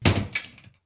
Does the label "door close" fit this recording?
Yes